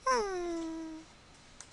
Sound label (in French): chien; MOBICLIC 89; TCHATCHE